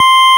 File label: FARFISA C5; USB Soundscan vol.09; Keyboards Old School; AKAI